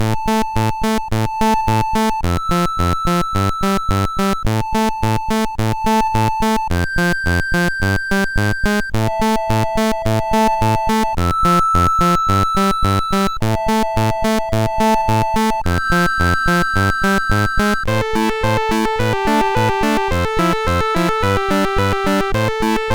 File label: Sound sid MOS6581R4